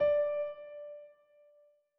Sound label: Piano (D4)